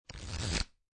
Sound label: Cards Playing Cards Flipping Through